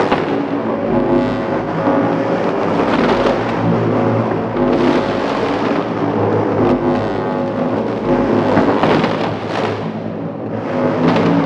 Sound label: Labs Large Electric Tube Loop; Warframe; Ambience; Entrati